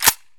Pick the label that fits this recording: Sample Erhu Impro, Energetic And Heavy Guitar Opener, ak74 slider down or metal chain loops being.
ak74 slider down